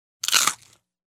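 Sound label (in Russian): один хруст чапса; Звуки откусывания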